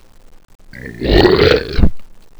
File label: alert; svencoop; AoMDC; monsters; handcrab